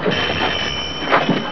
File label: PHONE, 电话; 特酷声音素材库